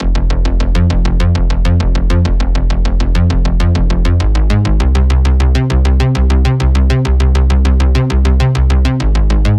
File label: Bass Loop 100 F Retro; Ghosthack